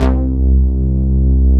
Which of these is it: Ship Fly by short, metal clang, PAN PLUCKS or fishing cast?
PAN PLUCKS